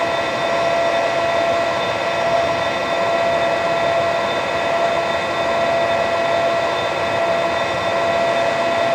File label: Uh-1H start up, aircraft; DCS